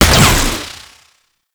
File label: Shield Lancer Pistol Alt Fire; Warframe; Lasrian Soldiers; Weapons